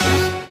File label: super Meter Win Line; mega joker